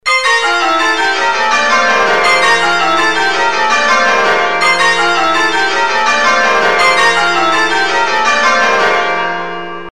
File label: Hagdyke, changeringing